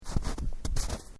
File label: Footsteps Boots Snow Step; Footstep Foley; Human